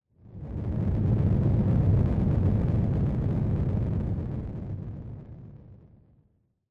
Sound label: Onoma Rumble Hard Short